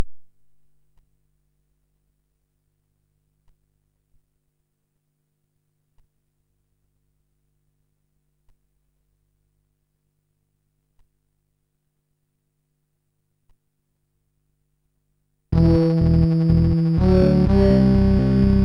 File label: mosquito; MusicLine